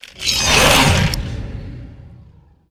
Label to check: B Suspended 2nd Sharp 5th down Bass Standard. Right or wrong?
Wrong